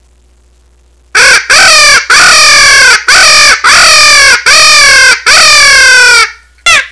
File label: bird distress